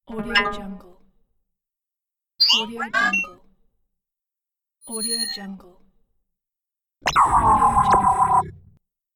alien tek; productionelements

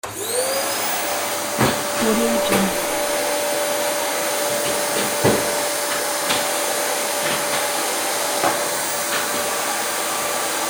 vacuum cleaner

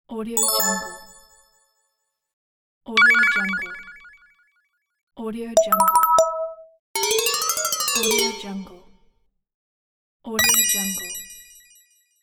notification blip

